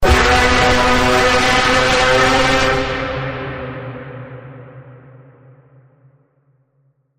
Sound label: Bend, B